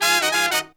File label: 96BPM, Horns